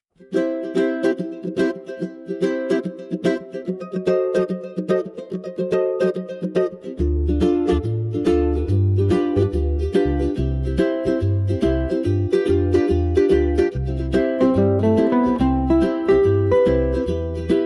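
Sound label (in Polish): Muzyka Hawajska; Mele Hawaii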